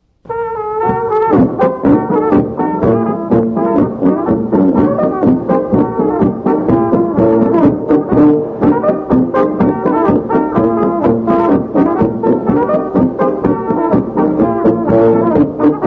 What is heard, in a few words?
AMERICAN GIRL POLKA